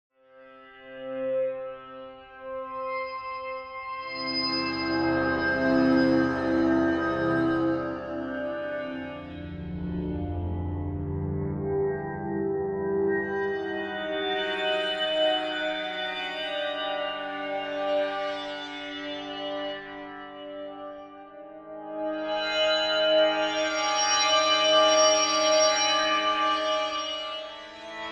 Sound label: PADS